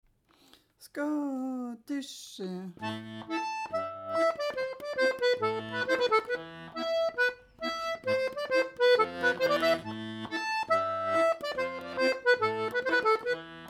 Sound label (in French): Scottish suédoise